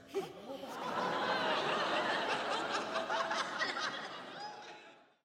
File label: crowd Reaction theater small Reveal; spellbound